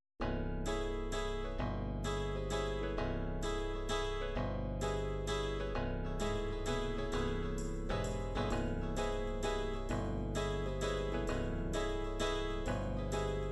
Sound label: psr9000 waltz, midi